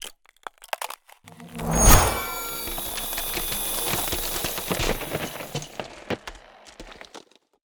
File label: Ice Spell Whoosh, Cold Whooshes; Arctic Aura; FrostBuster